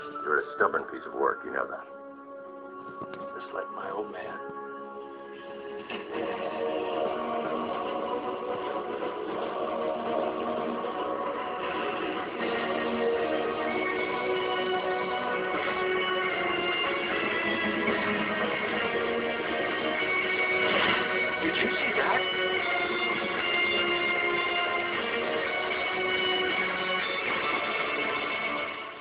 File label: guitar cover